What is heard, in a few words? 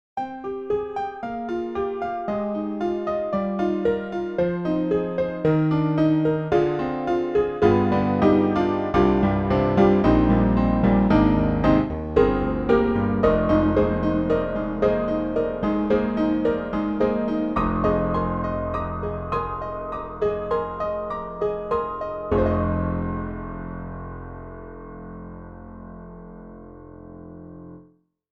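Piano Broken Piano Black Piano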